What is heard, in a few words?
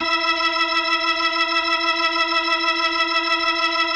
E4F, B3, Vintage Keyboards